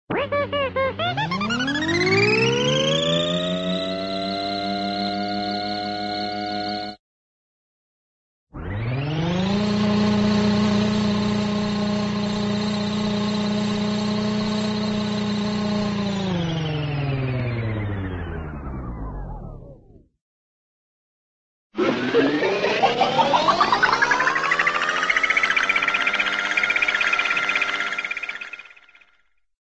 sci fi space capsule start, run; cartoon; jetsons

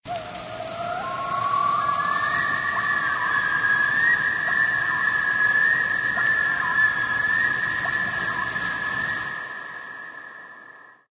animal call bird loon; Source Engine; tf; lair

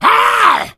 hunter pounce; source engine; left 4 dead 2

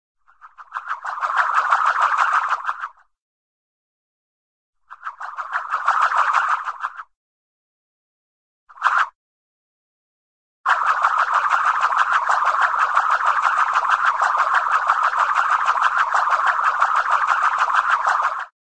twirl, cartoon frisbee twirl by, slow

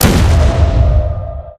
energy explosion, physcannon; video game; Source Engine; weapons

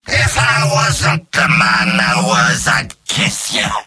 cheers; demoman; video games; source engine; tf; mvm

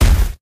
giant, player footsteps

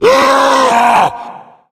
tank yell, voice yell; Left 4 Dead; Source Engine

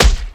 tongue hit, smoker

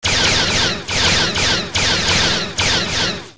disparo; Source Engine; deathrun starwars

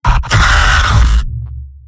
heavy pain severe; mvm

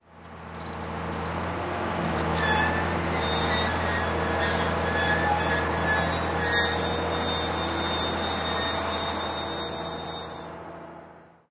crane move; ambient; Source Engine; cstrike

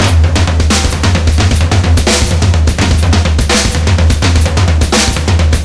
drums; Left 4 Dead 2; Source Engine; video games; zombat; horde

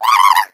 hot laser; Portal 2; Source Engine; video games; eggbot

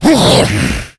rage, infected, male

